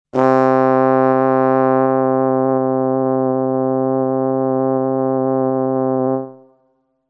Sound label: Trombone Breath; synth patches Korg Prologue; Dystopia